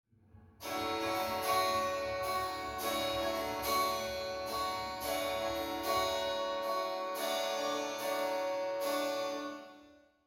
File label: reverb guitar